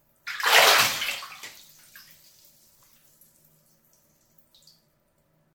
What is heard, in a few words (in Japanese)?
お風呂のお湯で遊ぶ 大きい音バシャ音